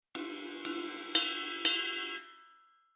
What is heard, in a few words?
Shuffle Ride, Rhythm